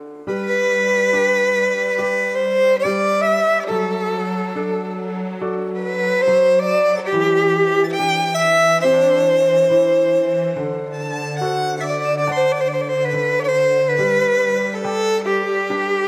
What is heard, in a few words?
Violin